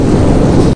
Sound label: fire flame; SAS Zombie Assault 4; PC Android iOS macOS